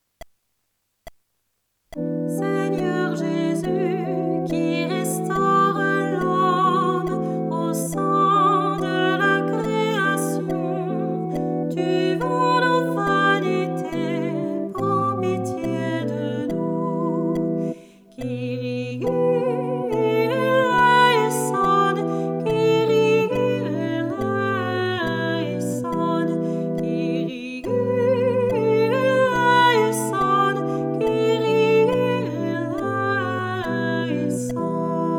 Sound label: Kyrie soprano; Kyrie Laudato Si